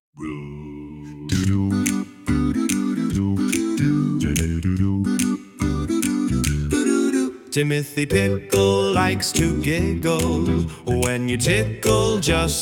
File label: Acapella